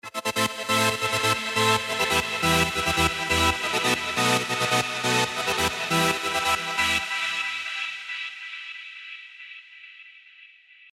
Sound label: Trance Gates; Pulse Mechanica; Revealed Serum 2 Trance Gates Vol. 1